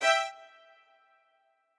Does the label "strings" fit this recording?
Yes